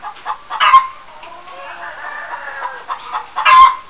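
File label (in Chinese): muji jiaosheng, hen clucking; jiachu jiaosheng; jiaqing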